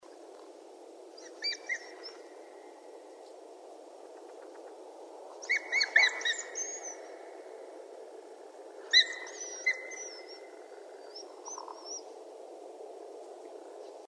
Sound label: Coyotes; Coyotes (Canis latrans)